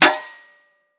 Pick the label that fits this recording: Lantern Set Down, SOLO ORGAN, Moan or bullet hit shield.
bullet hit shield